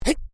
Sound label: MALE VOCALIZATION HAA; Exclamations; Voice